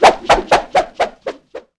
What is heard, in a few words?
shield boomerang; classicro